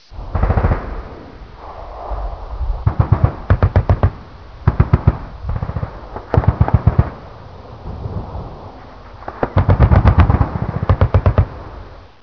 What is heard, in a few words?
warzone; quake2